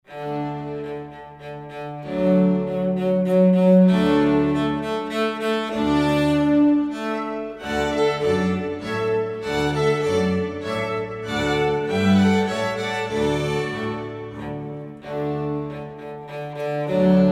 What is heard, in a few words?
Fanfare Minuet; Everything String